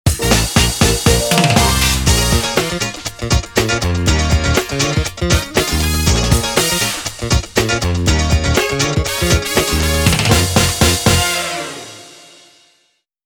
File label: BGM Rhythmical